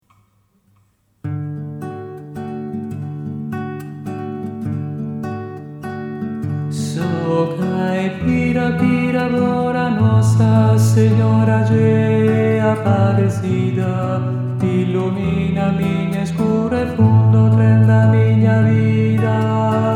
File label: tenor